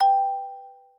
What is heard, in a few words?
One Drop, notifications; Galaxy S9